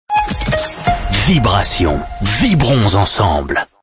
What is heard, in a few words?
jingle; vibration